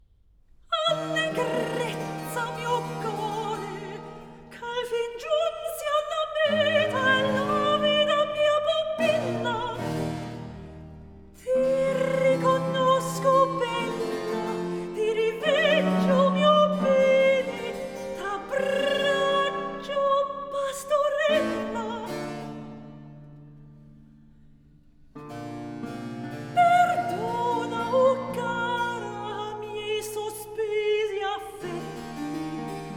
Cantata for soprano violin continuo Recitativo Allegrezza; Cantata Lungi dal vago volto; RV 680; Allegrezza mio core